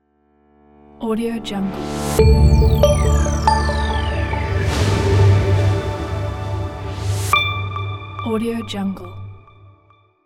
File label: brand logo intro